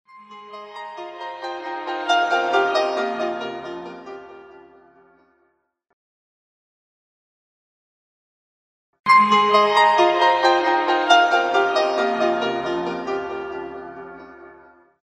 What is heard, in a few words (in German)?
digitale gong normal